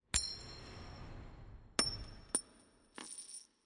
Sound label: coinflip long